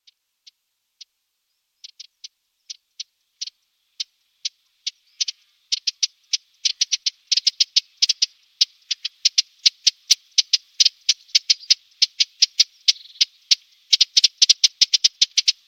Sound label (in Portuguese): Canto do toutinegra do atlas